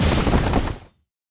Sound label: Clod bullet